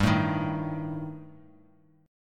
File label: G Suspended 2nd Sharp 5th G, Bass Standard; strummed; x,10,7,8